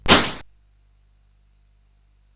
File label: Dark Castle, CSG8580R5